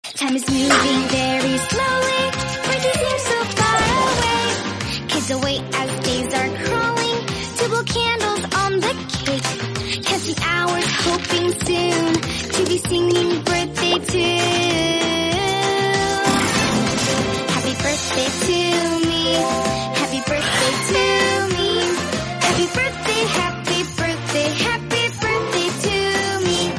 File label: happy birthday song; masha and the bear